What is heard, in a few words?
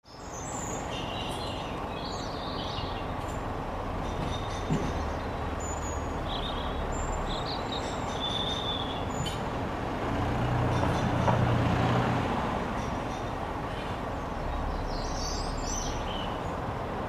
Suburban ambience near a street quiet vehicle passes by